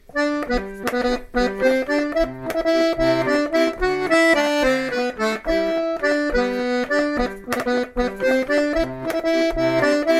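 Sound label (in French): fandango, accordéon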